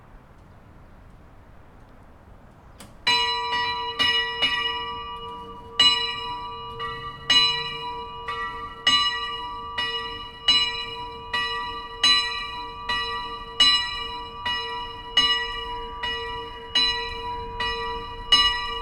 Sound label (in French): cloche; Willisau St-Nikolaus; campanologie; Assemblée générale 2013 de la GCCS à Willisau (LU)